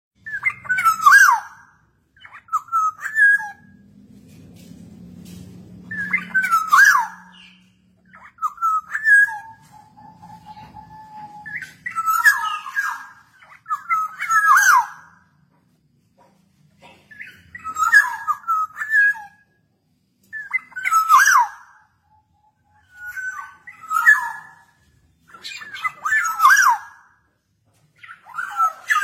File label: ringtone